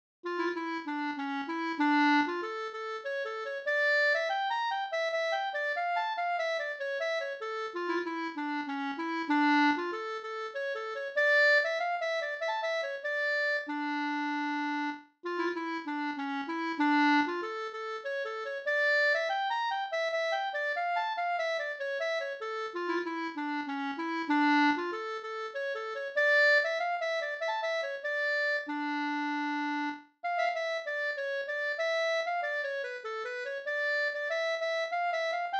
polska

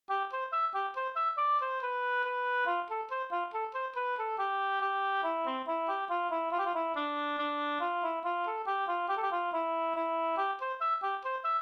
hambopolska

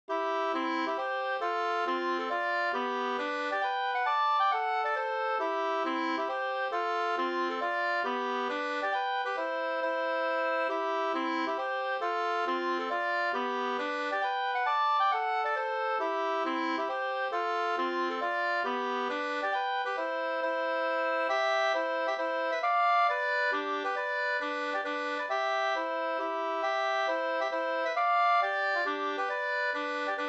hambopolska

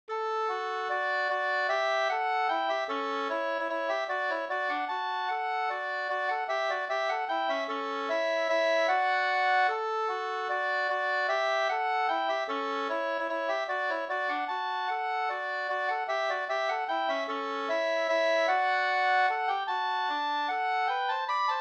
vals; Osteraker

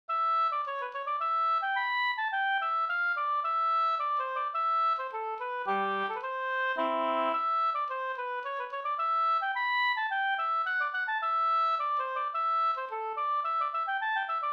brudmarsch kyrkmarsch; Vinkols nya kyrkmarsch av Mikael Pettersson

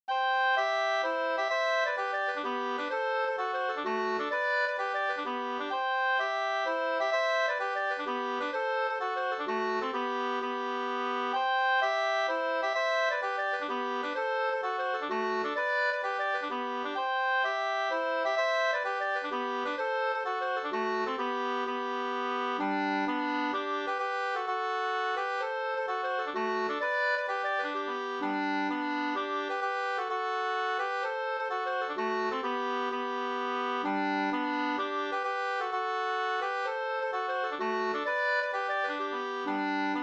polska Undersaker

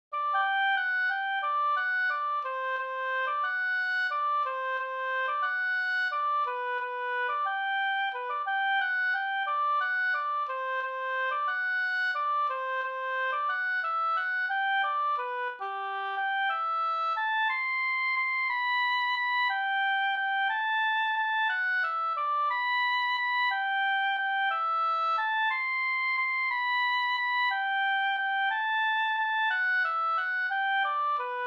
folkdans; Hammerschmiedgesellen

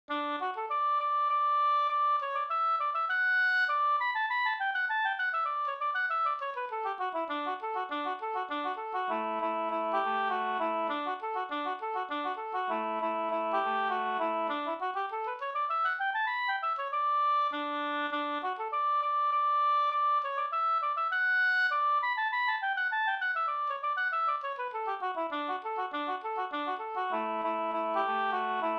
slängpolska

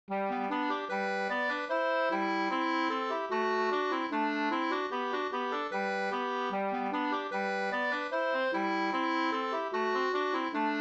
schottis

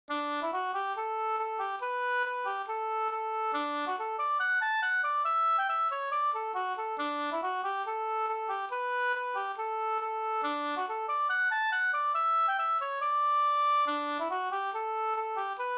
schottis 4-4; e Harald Danielsson Nasteln Jm

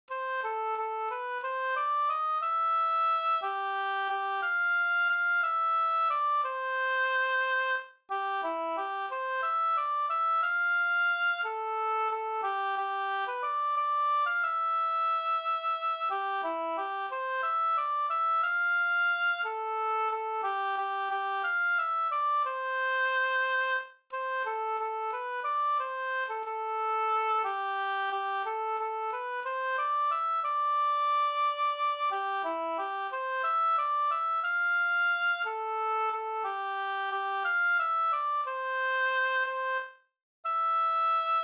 vals Cdur

